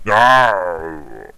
pain, zombies

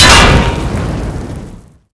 tank shell metal; wac

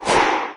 attack swish, maggot; doom3

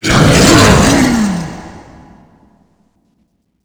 shout long angry; glasgavelen

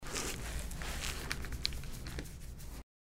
gecko scratch; npc

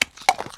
foot; spider

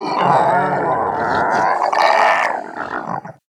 idle, zomb female; nmr

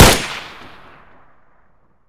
galil

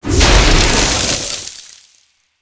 magic ice spear

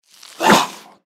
feral ghoul attack; npc

